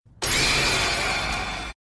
sharingan sfx

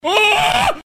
uaaghh; jerma

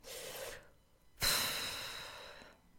sigh

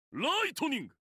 lightning

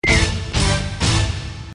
flag capture

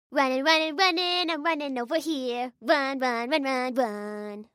running running running

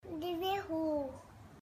woohoo

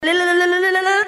lalala

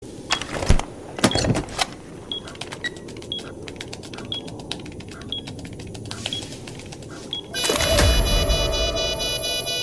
defuser alarm; rainbow six siege

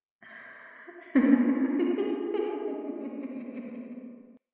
woman laugh, woman ghost laugh

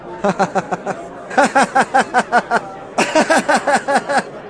laughing

